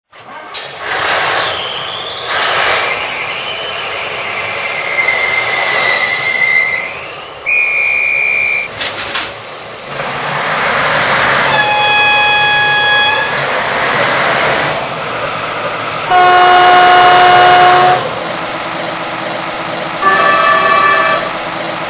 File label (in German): Tonbeispiel V11; Bachmann-Liliput